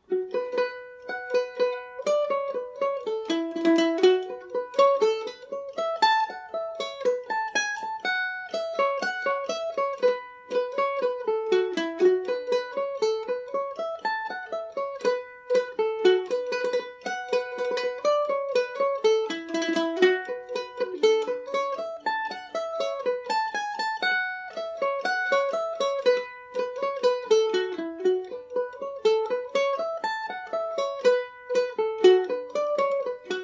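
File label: B minor, Irish mandolin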